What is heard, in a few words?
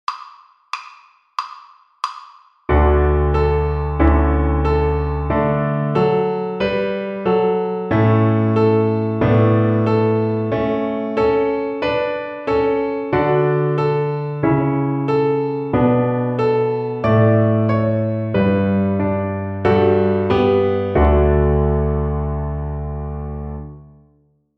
String Gym, Piano Accompaniments